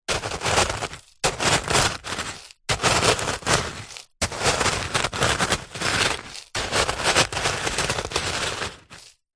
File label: foley stones small wood batton digs movements